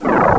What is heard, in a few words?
dribble, electronic sounds; Sound Effects for Multimedia - Volume 1